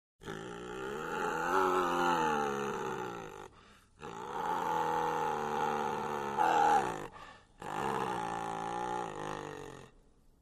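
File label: TIGER BABY close up GROWLS; wildlife sounds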